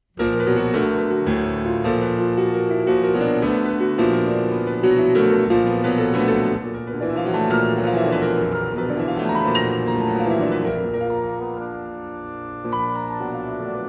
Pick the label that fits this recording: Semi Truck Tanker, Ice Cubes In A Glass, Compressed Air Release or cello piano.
cello piano